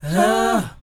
BOYZ G, Vocal Planet R&B & Dance; ILIO

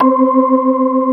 C4, Keyboards Old School; USB Soundscan vol.09; AKAI; SMALL B3

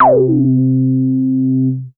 C3, BASS; Q Up Arts; Dream Experience; BASS BANK 16

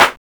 LINN CLAP; Drums In Your FACE!; CLAPS

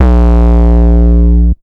Basses, Bb; Total Drum&Bass

